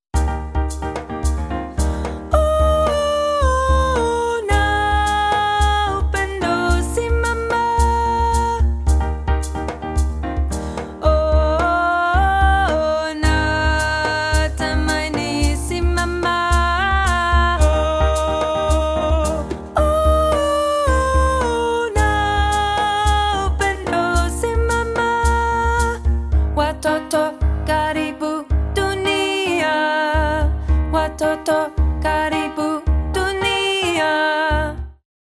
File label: Kusimama chorus; part 2